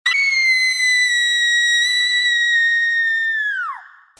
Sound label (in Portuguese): grito; wttsounds